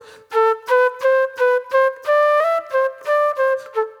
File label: Soul Plate Flute